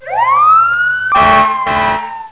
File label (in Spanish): sirena